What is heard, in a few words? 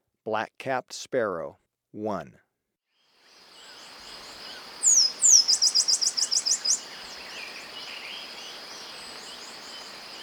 Black-capped Sparrow, Birds of Ecuador; S. America